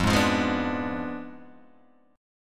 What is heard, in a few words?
F Diminished 7th F down Guitar Open G